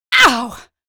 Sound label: pain, female